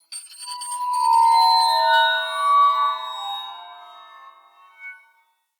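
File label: Cave Crystal Magic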